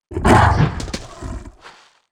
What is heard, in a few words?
Infected beast attack